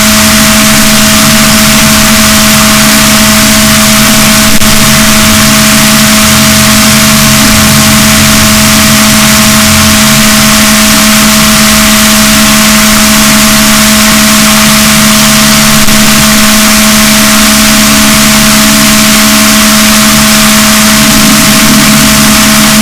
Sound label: rorquals negatif, boat